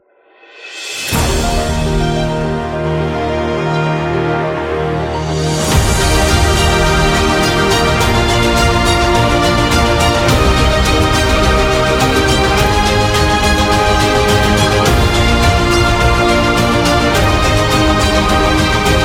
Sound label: Award Ceremony Opening Intro